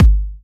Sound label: Hard Kicks; Vengeance Samples